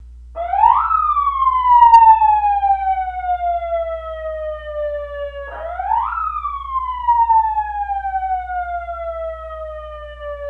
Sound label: Police Siren